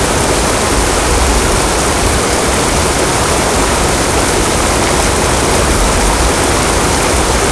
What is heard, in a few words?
waterfall